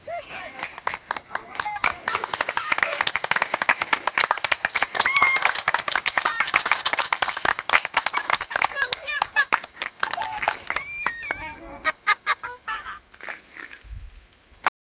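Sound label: cheerful laughter and happy; camera shutter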